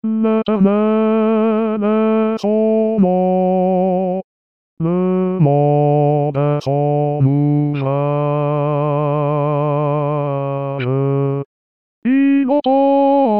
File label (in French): Basse; Liszt; L'Eternel Est Son Nom